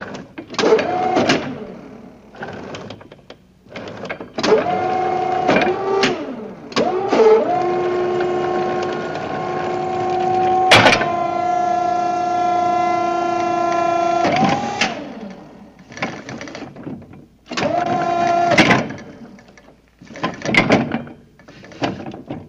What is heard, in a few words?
Forklift Vari Sounds